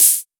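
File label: TR909 Snare, Roland SH-32 Drumset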